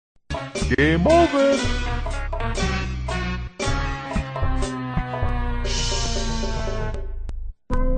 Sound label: game over